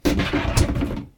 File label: Lawnmower wheel hits plastic bin